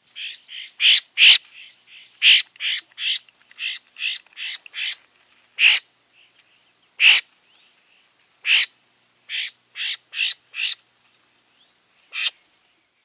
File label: Florida Scrub Jay Angry interaction calls